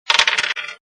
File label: impact piece; Collection Synesthésie; Isabelle Grosse